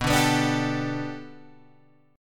B Minor 7th Sharp 5th B Guitar Open G, chord

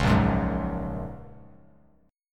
Bb Minor 7th Sharp 5th, Bass Standard; strummed; JGuitar

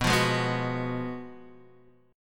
Gb Major 7th Suspended 2nd Suspended 4th

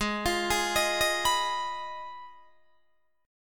Ab Minor 6th, Ab, Guitar, Open D

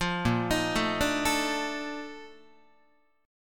Bb Major 11th Bb Guitar; arpeggiated

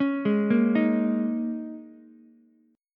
G sharp Suspended 2nd Suspended 4th, Ukulele; arpeggiated; 6,8,6,6

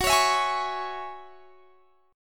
F sharp 7th Suspended 2nd F sharp down; F#7sus2 strummed

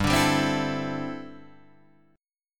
D 7th Suspended 2nd Suspended 4th, G

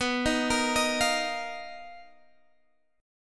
B Major Sharp 11th, B, down, Guitar Open G

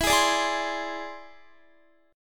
E Augmented 7th E down Guitar

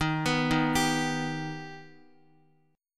Eb Augmented Eb, Guitar; Eb+ arpeggiated; x,x,1,4,3,2; JGuitar

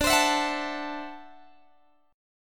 D 9th D down Guitar Open G

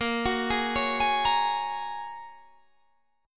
Bb Minor 7th Sharp 5th, Guitar, Half Step Down

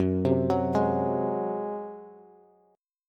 Gb Suspended 2nd Flat 5th Bass; Gbsus2b5 arpeggiated

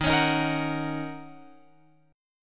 Eb Minor 7th Eb Guitar Open F; Ebm7 strummed

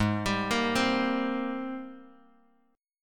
Ab Major 9th Ab down Bass Standard; AbM9; arpeggiated

